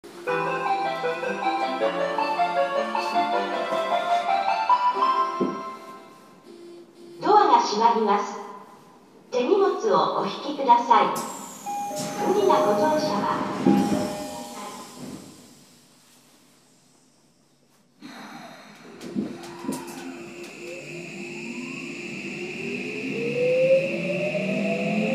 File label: vvvf, mitsubishi; tokyo9205a